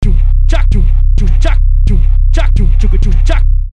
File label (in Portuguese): Base De Funk